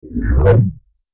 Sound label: time start; FXHome